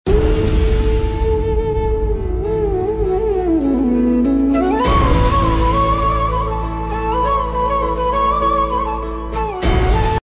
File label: heart touching instrumental ringtone flute bgm ringtone